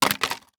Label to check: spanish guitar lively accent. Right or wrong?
Wrong